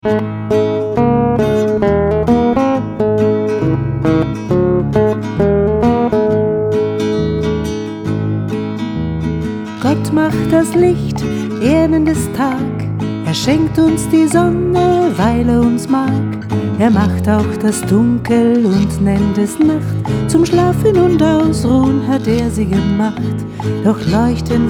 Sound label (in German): Lied von der Schoepfung